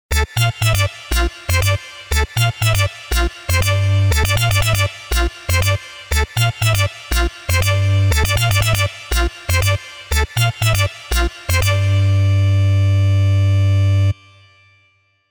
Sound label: Wavetable Filthy